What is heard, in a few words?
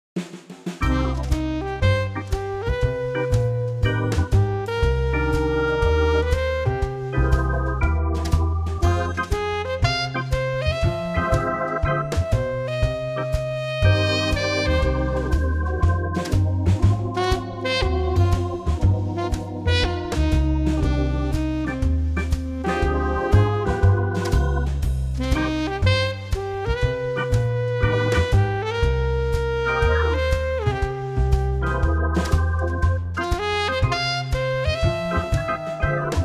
sax